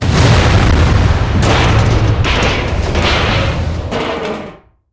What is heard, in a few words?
boss explosion; mvm forever